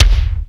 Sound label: big ol slop, percussive